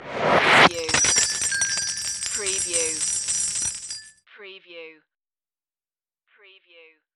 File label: Whip Chimes